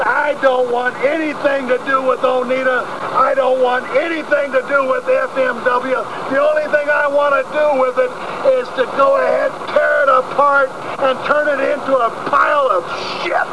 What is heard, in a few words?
funk